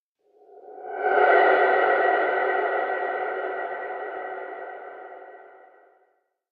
moss; Barotraumatic; Brainmoss1

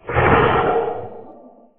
damage; Barotrauma; Barotraumatic; Abyssalcrawler

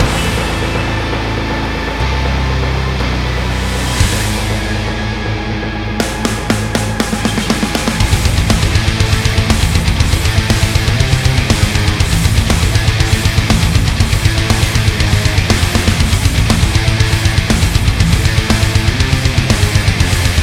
Rock Rain And Pain; Barotrauma; DynamicEuropa

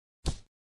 angel step; Footsteps; Barotraumatic

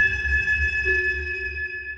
sonar Tail Water Far Shuttle Directional; Barotrauma; Real Sonar

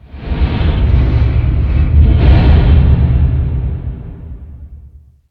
Pylon damage; Barotraumatic

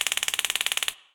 tazer hit; Weapons; EK modern; Barotrauma

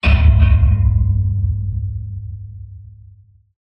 structure blunt; Barotrauma; Subsurface; Damage

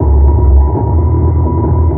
sonar Ping Suit Very Close Shuttle; Barotrauma; Real Sonar

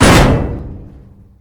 gunnery cannon shell; Weapons; EK modern; Barotrauma

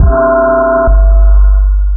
sonar Ping Suit Close Beacon; Barotrauma; Real Sonar

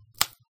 bonebreak; Barotrauma; Neurotrauma

